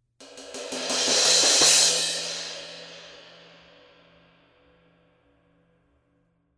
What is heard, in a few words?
16 Custom Dry Crash Build Crash, cymbal